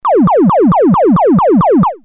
lfo saw; nord

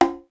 dyn conga; sr16 perc

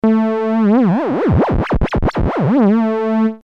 lfo; nord

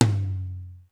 md amb tom; sr16 tom

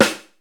sd, rx5

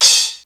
choke; xr10

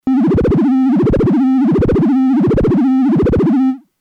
lfo mod; nord3

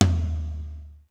lo hall tm; sr16 tom

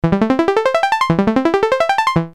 arp up; nord

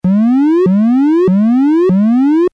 lfo up saw; nord3

